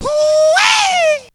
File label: VOX; Zero G; Man Machine